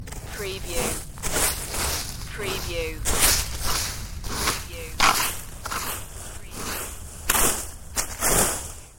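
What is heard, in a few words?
Raking Leaves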